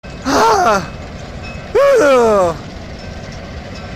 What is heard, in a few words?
ahhhhhhhhhhh